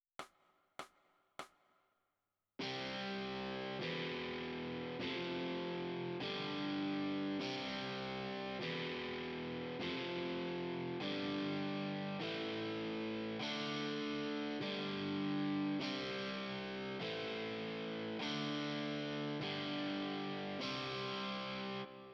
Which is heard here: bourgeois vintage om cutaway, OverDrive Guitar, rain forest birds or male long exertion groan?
OverDrive Guitar